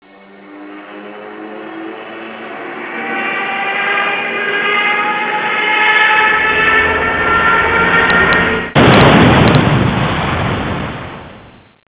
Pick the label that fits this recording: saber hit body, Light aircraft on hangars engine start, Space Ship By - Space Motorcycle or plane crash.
plane crash